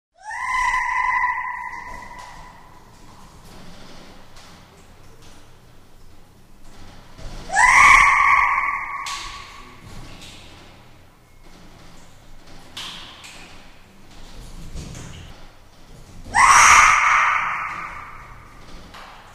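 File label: sounds of monkeys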